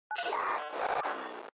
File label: robot; Flashyn